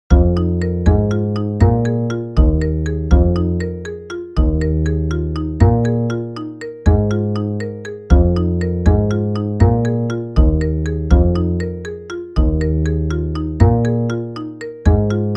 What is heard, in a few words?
Core Pattern Bass